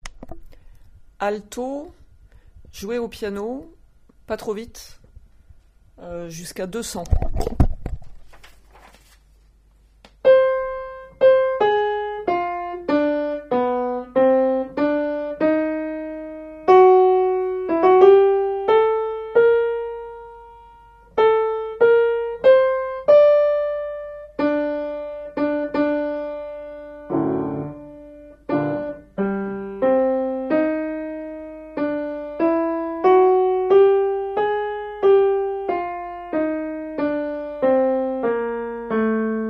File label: alto Piano; libera me